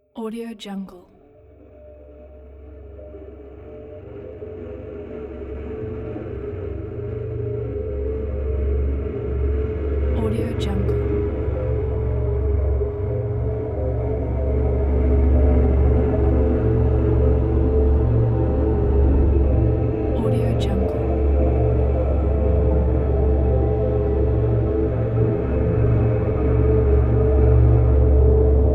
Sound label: suspenseful dark ambience